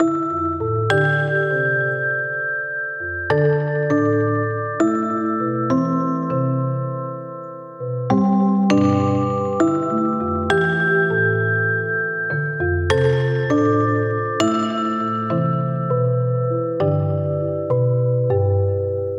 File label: Mallet Loop 100BPM Amin; 99Sounds; Monosounds; Music Loops; Instrument Loops